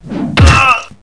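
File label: beast kick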